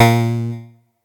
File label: atari 520st As2